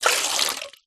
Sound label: household water pour into metal bowl